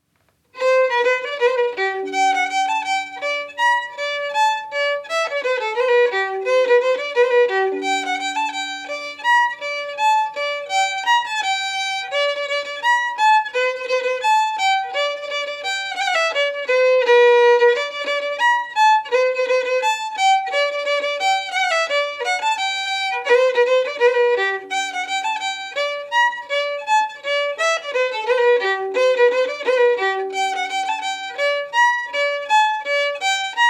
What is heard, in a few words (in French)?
Scottishs, Violon du Poitou